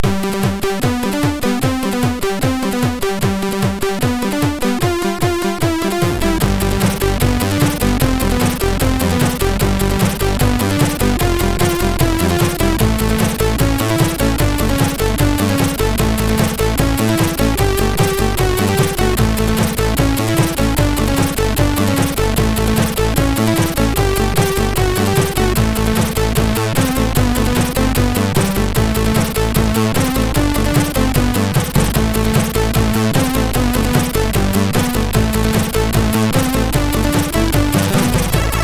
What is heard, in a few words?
Swing, sid MOS6581R3; SoedeSoft